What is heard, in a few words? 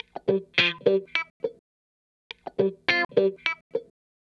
104 Pop Guitar Wah C min, Electric; Pop Rock; Electric 100-160 bpm; Pro Tools Essential Loops; Digidesign